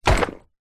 foley luggage bag rucksack set down items rattle inside; zapsplat